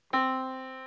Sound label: Yamaha Disk, piano sound